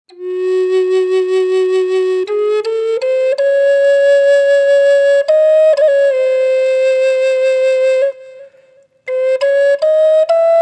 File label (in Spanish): Nativa hiyaz f, Flauta, Roble; flauta de medicina; varias tonalidades